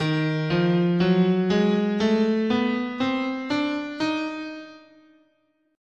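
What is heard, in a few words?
E flat Diminished Whole Half, guitar, scale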